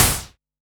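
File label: Analog SY1 Snare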